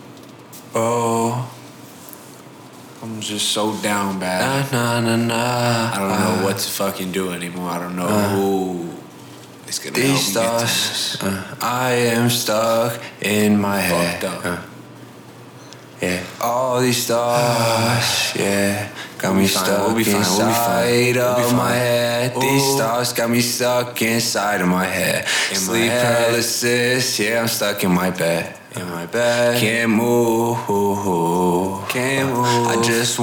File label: HH Trap 150 Dm